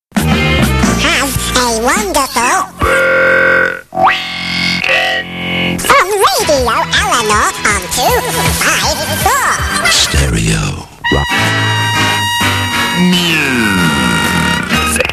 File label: Jingles